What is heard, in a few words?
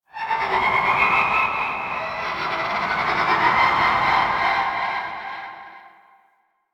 Void Connector Shriek One Off, Ambience; Warframe; Citrines Last Wish